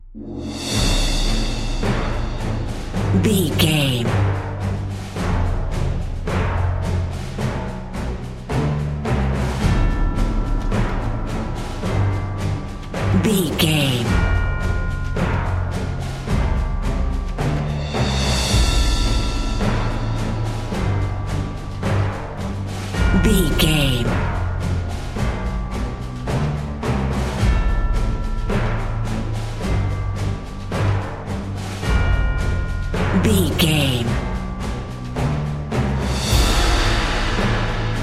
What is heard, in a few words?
epic cinematic dnb drum and bass mix